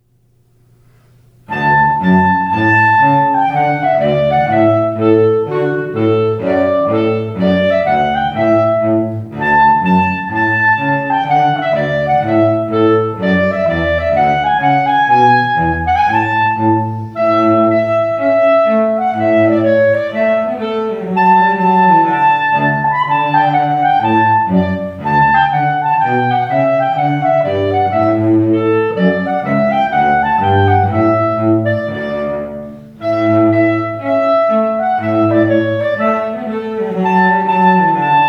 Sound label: trumpet tune